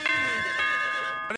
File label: Doorbell